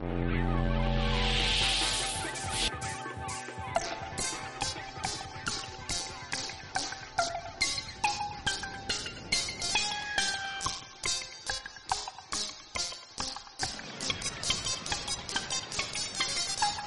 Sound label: Horror mansion